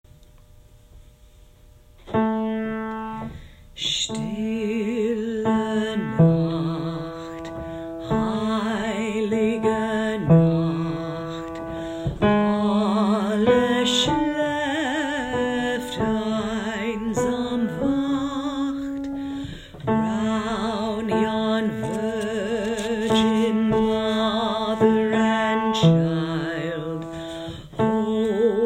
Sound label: Silent night tenor